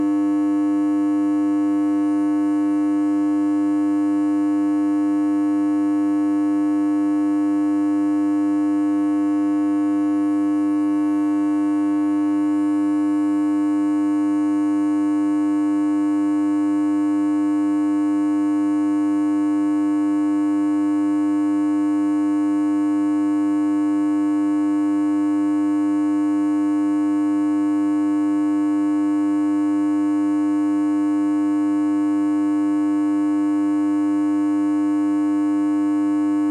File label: 100 hz